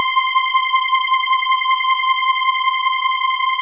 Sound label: Super Saw C6